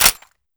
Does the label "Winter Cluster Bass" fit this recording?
No